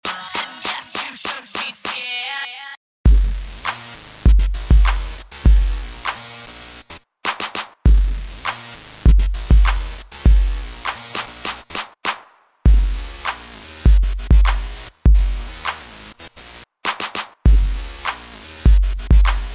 kick beats synthed

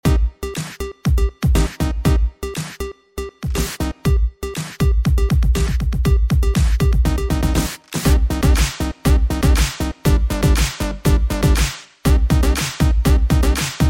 Popular beat

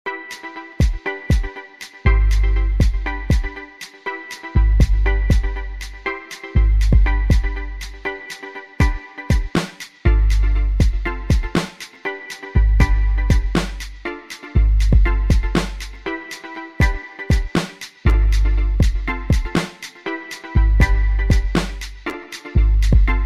Summer arps

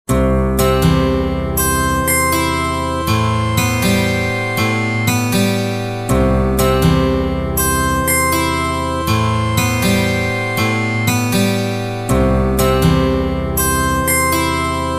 12 String

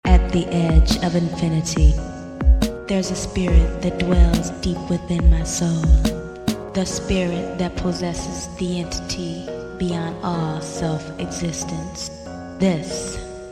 Smooth morning step

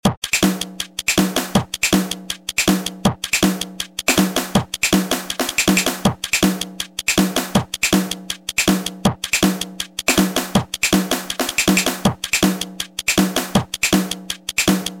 Warm Up Beat

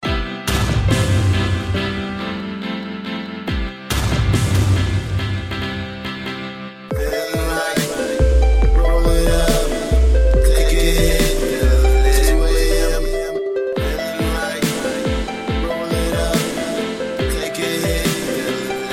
Soft Dub

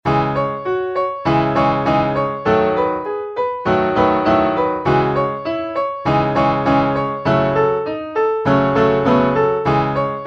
E piano

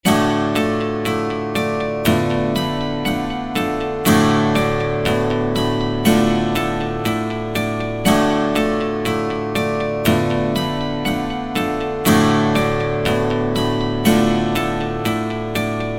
Guitar

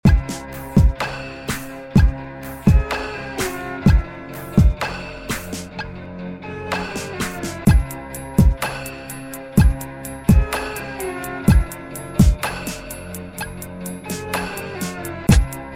Violin Beat

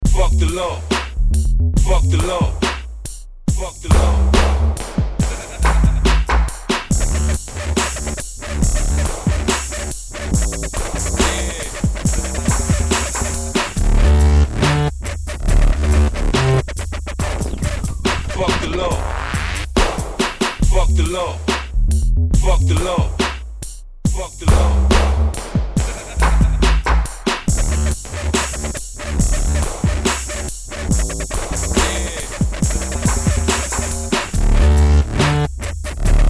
Dubstep remix

